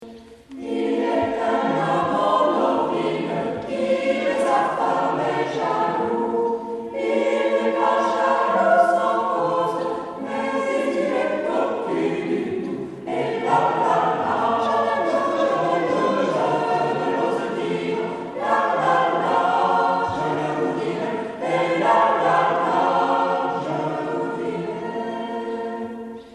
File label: Lalala